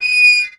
Amb Constructions; Red Orchestra Ostfront 41-45

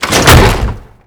target pop up wood; Call of Duty Modern Warfare

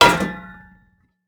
metal; Rust

